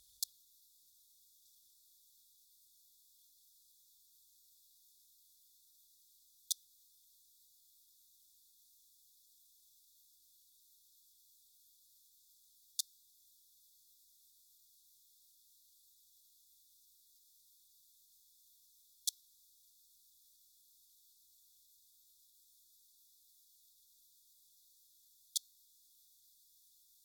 lept punct, Leptophyes punctatissima

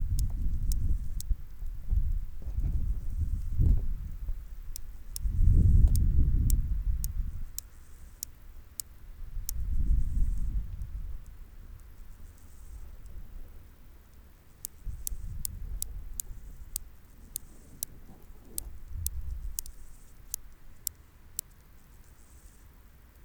Stethophyma grossum

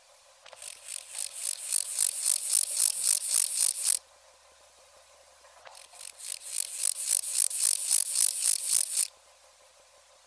pseudochor mon, Pseudochorthippus montanus

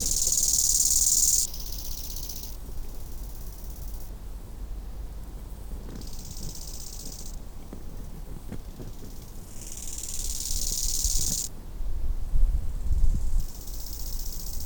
Chorthippus biguttulus

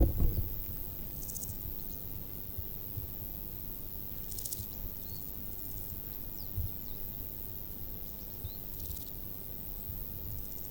C dispar, Chrysochraon dispar

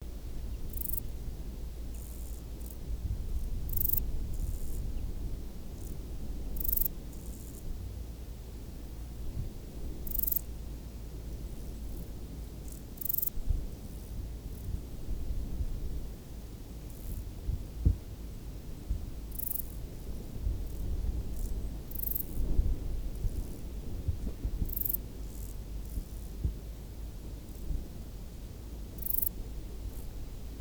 Euthystira brachyptera

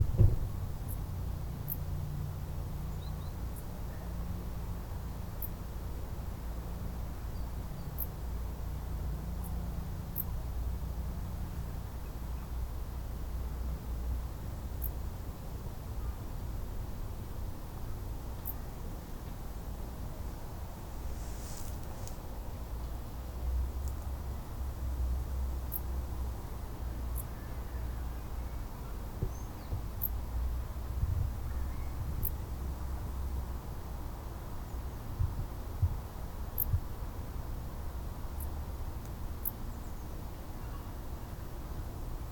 P griseoaptera

